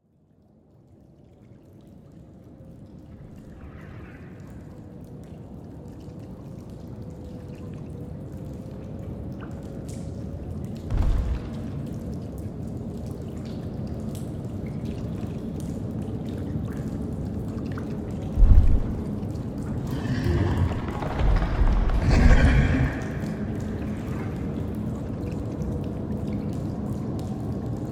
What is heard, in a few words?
amb cave; ambiance; environment track; caves